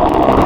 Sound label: Sheep, Wavetables; Cymatics; Serum; Animals for Serum - Gold Edition